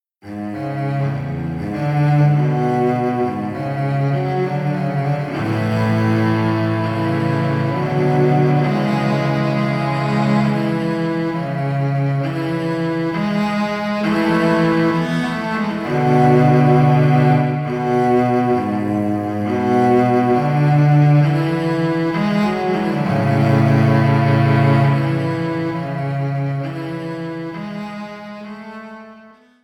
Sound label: Cello Duet